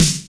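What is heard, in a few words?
snare drum; E-Lab; X Static Goldmine 1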